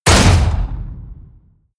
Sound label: blast, hits explosionen metall